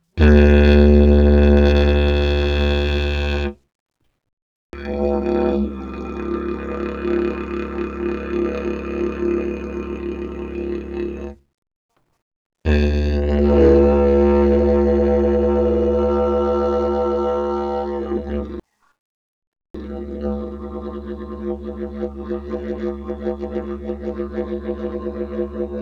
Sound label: Lloyd Vocalisation